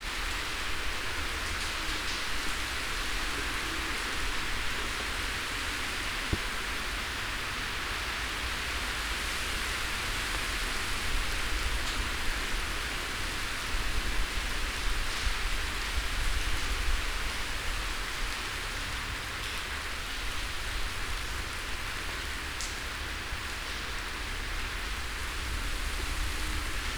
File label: freezing wind and rain